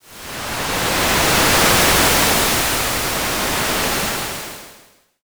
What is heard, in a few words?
wave